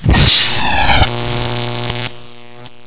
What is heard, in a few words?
ball die, weapons